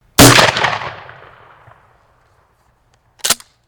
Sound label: Shotgun Close